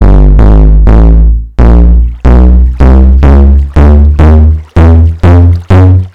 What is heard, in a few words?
bass boost note block